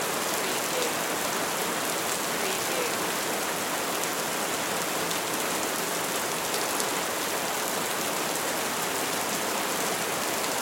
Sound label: Rain Ambience Loop